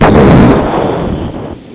chocolate milk

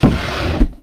drawer open

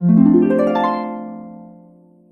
harp; William

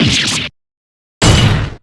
damage; blackquill

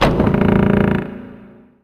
door open

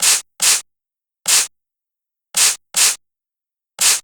spraying

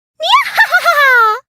"nyahahaha"; drv3

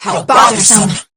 shy; p4u; aigis; shadow

